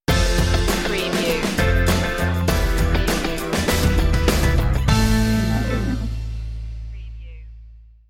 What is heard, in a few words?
funk punch stinger